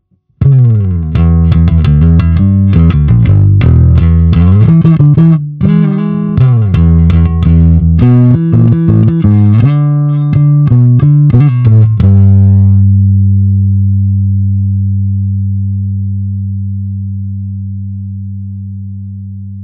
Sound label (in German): Kompressor Bass MD421